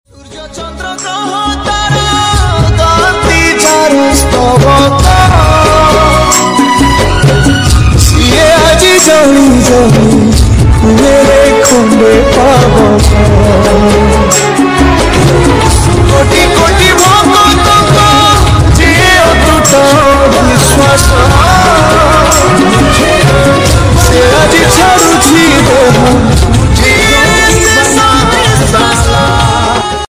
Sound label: new odia ringtone bhajan song ringtone; jagannath